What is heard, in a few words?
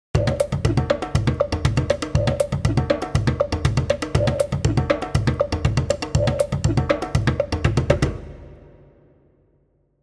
vibes percussion; chiqui audio